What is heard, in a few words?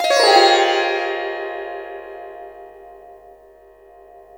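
sitar glissando; Spectrasonics; Heart of Asia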